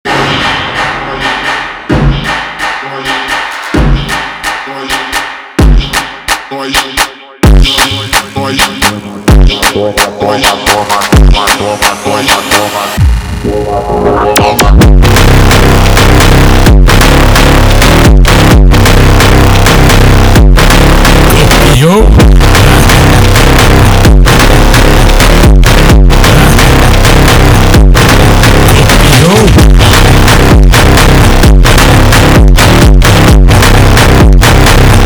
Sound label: pumping funk